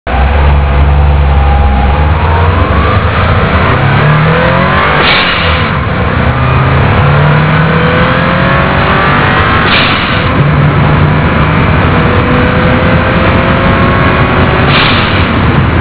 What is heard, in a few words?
Forge BOV Sound